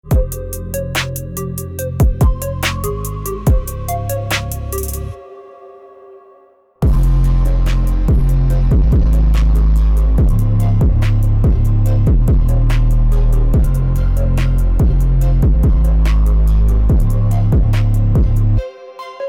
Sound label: Hard hitting frequencies bassline; bass boosted